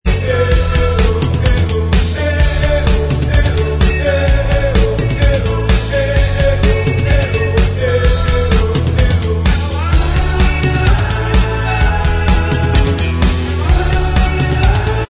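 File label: backing vocals track, backing tracks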